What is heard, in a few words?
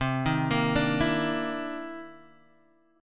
C Minor 7th Flat 11th, chord; Cm7b11 Chord {X 3 1 3 3 0} arpeggiated